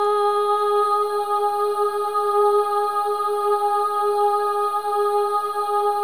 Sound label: SOLO BOY, AH; Spectrasonics; Symphony of Voices